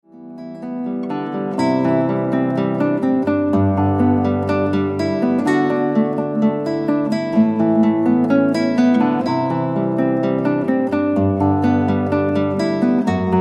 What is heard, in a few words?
Instrumental